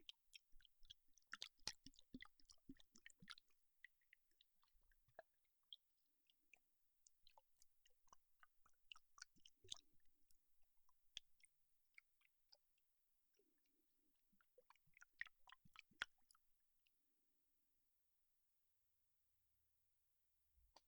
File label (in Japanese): おまけの炭酸耳マッサージ